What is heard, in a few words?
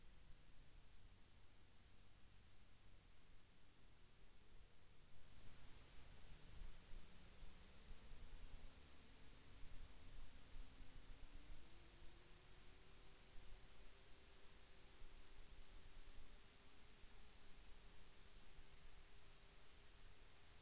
Long Grass Whispers